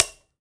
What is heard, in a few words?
Prepared Drums